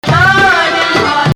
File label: Nahawand